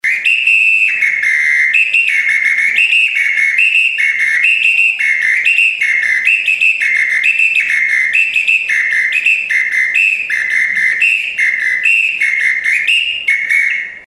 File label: APITO; le bresil